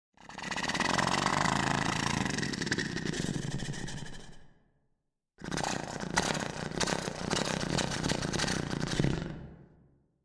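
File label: engine sound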